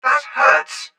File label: droid target droid